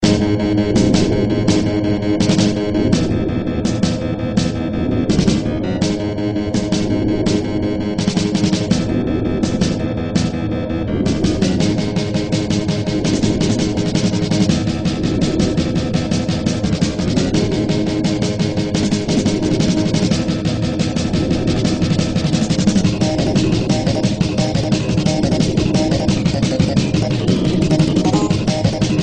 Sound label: Wind; Fzero-death